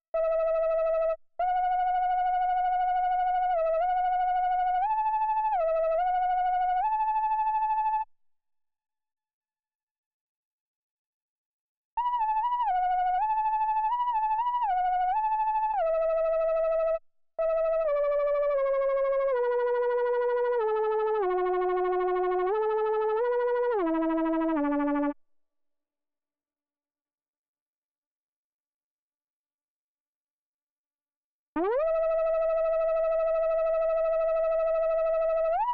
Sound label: MOOG